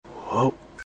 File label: whoa; reverse